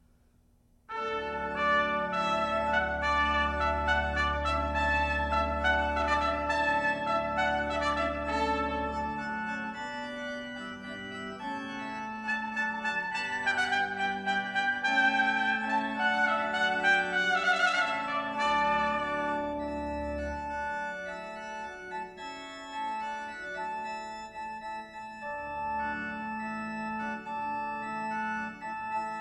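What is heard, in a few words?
Hornpipe with Organ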